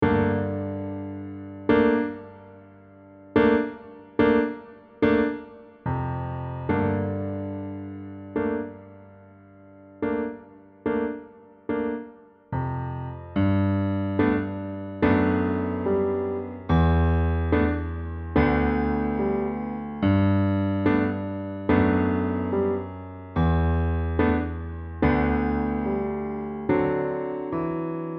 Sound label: Accompaniment; ViolinSchool